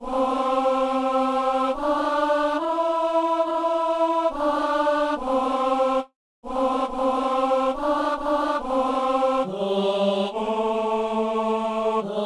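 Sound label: tenor; Messe de Saint Paul; Agnus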